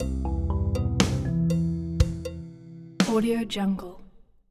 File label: mobile game musical theme sweet whimsy objective complete; esmteam